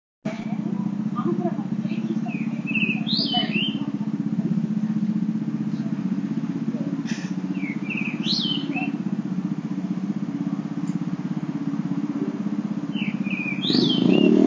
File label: ooruri